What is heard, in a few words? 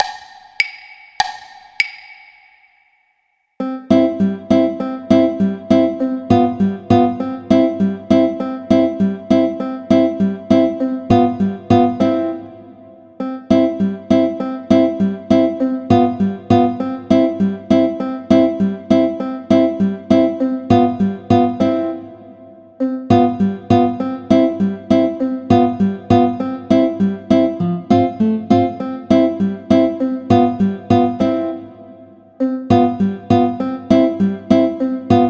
Andantino Violin; Paganini; Sonatas Op1 Vl Gu